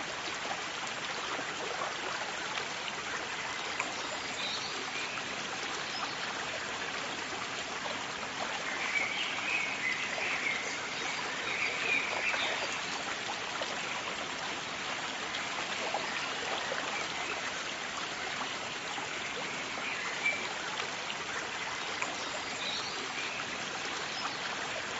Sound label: Park River